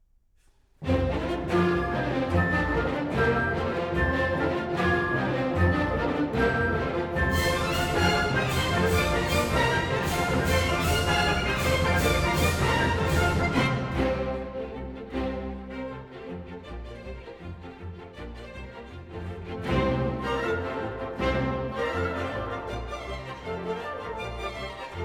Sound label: Hungarian Dances for orchestra, F major; Brahms